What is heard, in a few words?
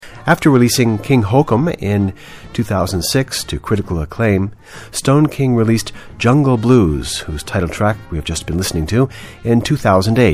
Blues Band; new blues band's first visit to Spain